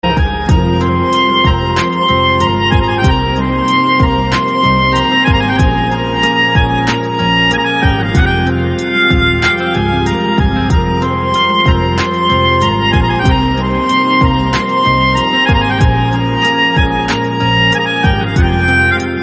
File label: sad instrumental ringtone; aashuqi 2